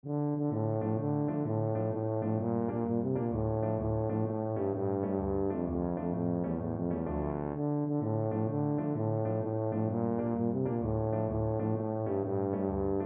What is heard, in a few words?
tarantella, tuba